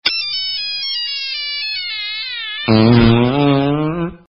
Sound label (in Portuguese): Nokia Far Pum, nokia peido